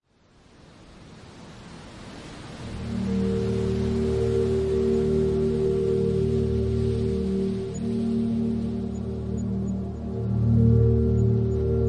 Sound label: Meditate Music Only; GoMo Meditate